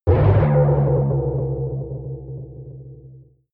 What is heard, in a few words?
protective shield hit absorption